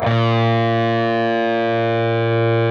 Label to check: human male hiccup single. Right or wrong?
Wrong